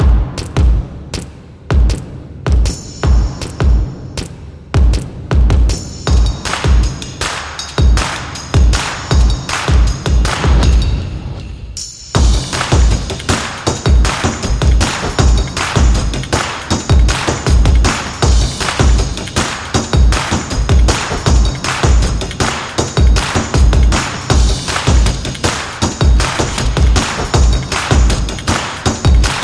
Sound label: Percussive; percussive nation vol 2